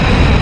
rocket; Soldat

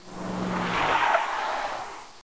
Driving; Elaina

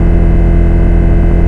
low mach, amb machine; environment

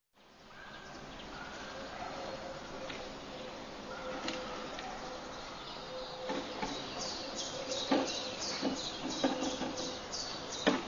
housing; PHARAOH

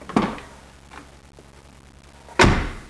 door; transpo

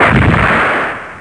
Ship Dead - expl2; Spaceward Ho 4.0

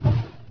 Plasma Splat; The War Engine

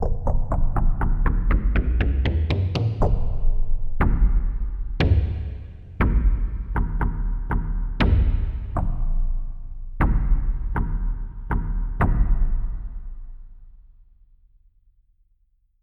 Taiko Drum; FF7

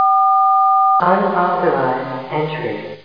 quake; Duke Nukem 3D; Sector4

